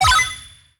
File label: Bonus Balloon